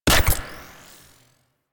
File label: UI Allocation Start; DE; Warframe; Railjack Player Ship